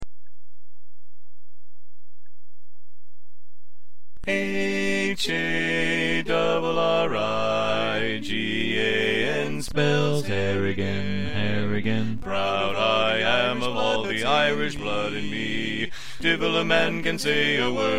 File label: Bass